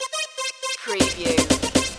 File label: retro electro loop